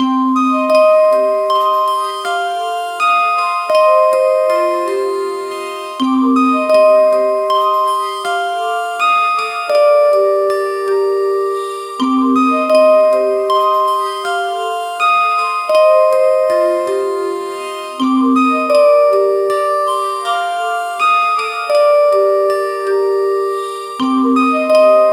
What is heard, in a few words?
fantasy loop, glass harmonica; 15 second fantasy loop